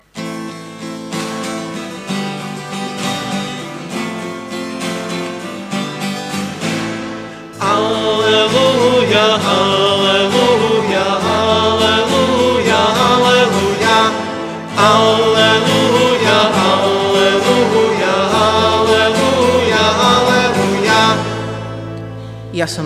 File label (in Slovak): Aleluja